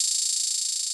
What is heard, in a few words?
Roll 3 Hi-Hat; Closed Hats